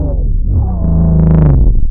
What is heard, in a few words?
Deep Loading Looping